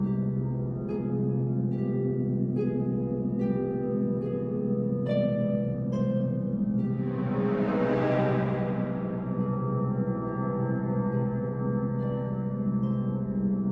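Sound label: Music Stingers loop Secret Room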